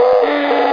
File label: roar; doom